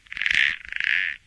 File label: small frog, frog groups; ambient; random animals; Left 4 Dead 2; Source Engine